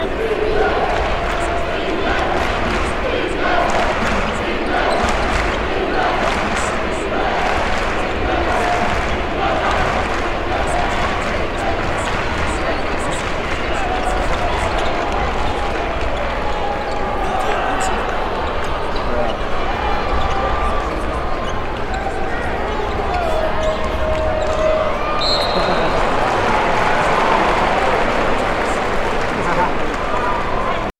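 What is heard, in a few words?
Arena Crowd Noise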